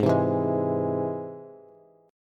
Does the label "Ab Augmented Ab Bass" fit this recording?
Yes